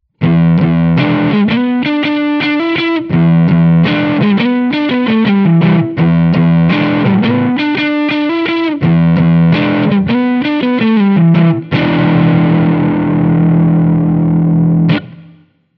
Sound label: crunch neck parallel, drive – neck-PU in parallel; Manne Ventura